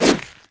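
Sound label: wolfman basic attack; LoL; warwick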